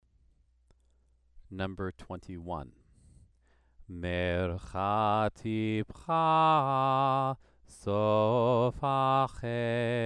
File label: Torah trope exercise